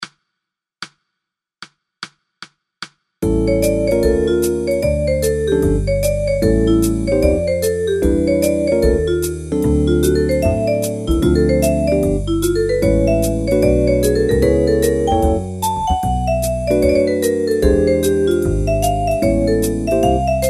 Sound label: Blues Major